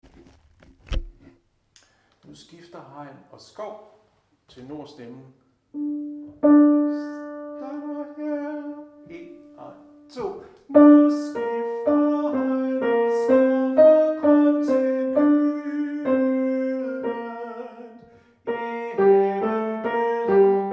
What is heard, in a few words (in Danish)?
tenor, harlev koret